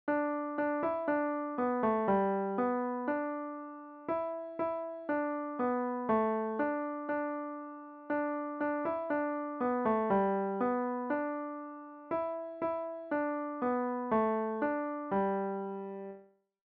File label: piano solo